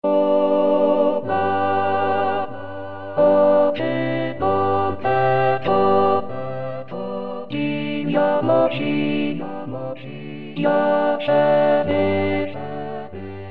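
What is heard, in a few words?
alto; Eco de lassus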